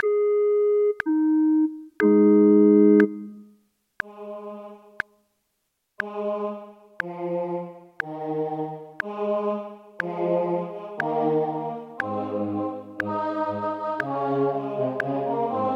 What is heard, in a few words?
O clap your hands; Gibbons